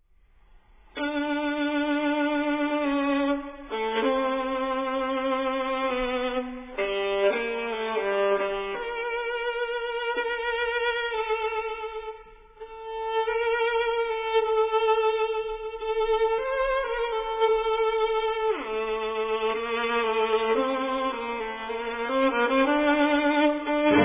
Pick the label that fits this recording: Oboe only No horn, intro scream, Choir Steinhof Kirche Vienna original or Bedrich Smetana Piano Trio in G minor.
Bedrich Smetana Piano Trio in G minor